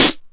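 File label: distorted hi-hat closed, drum machine; DR.ACCESSOR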